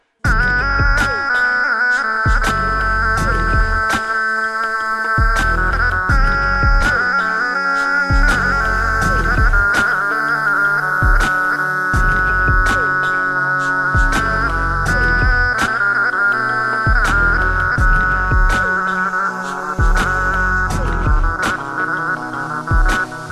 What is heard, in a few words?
Shqip Instrumental